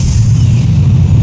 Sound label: afterburner, aircraft engines